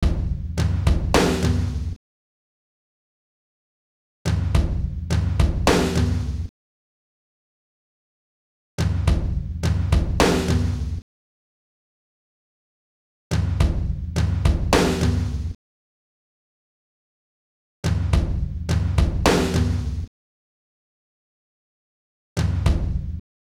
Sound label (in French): batterie